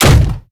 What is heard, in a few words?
melee hit; argus; smite; hera